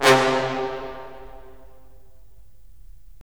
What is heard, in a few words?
BRS, Roland LA Composer